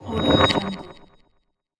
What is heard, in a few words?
pulse ring transition